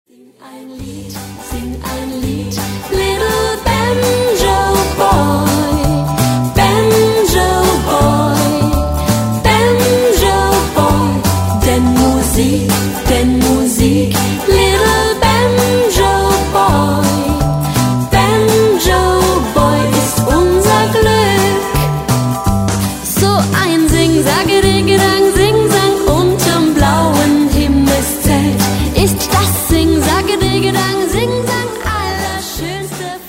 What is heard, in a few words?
Banjo